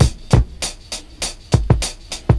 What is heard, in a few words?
100bpm, breakcore